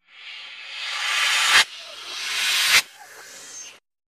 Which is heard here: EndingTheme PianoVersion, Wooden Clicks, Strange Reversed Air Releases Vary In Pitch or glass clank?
Strange Reversed Air Releases Vary In Pitch